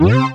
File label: SYN Going High; Best Service Gigapack 1